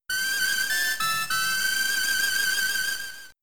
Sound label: Grass Whistle; Pokemon; Gen 3; attack moves; RSE